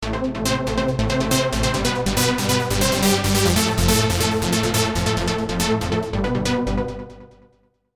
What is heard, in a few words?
Trance